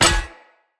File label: Hammer Impact Shield; Weapon Impacts; Player; Combat; Tuvalkane Hammer